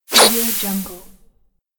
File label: anime sword shine; nektar pro